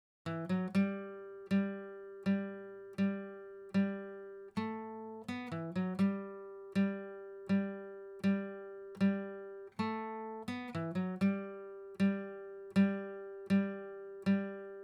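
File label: acoustic pattern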